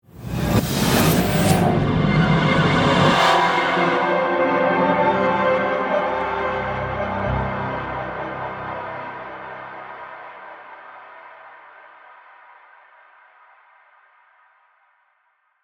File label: Musical Ghostly, Horror, Musical, Ghostly, Swell, Eerie; Scary Soundscape Sound Effects; BlastWave